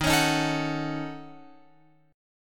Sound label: Eb Minor 7th Flat 5th, down, Guitar, Open F; strummed